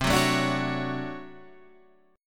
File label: D Major 7th, chord; Dmaj7/C; strummed; JGuitar